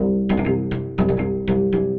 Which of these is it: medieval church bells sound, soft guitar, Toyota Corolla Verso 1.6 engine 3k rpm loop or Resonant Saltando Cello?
Resonant Saltando Cello